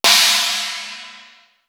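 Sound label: CHINA, cymbal; CYMB.HH; East West; Global Sounds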